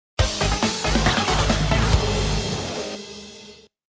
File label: Song Bonus, Disco; Disco Devil; Friday Night Funkin' The Origami King